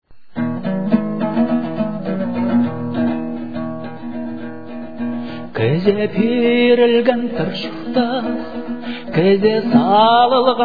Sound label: churtas, throat singing